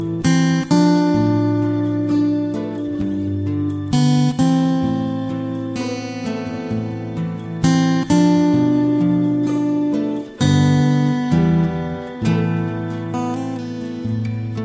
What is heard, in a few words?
menu song; metro 2033